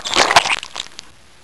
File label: eye open; boss; sawer